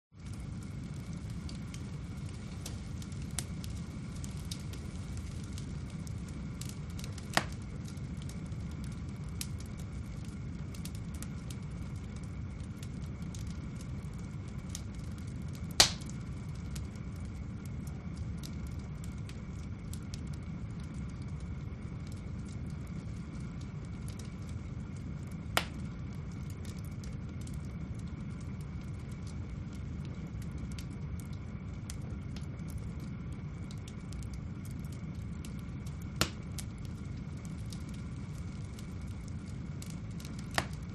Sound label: Fire Medium Roar Hiss; audio hero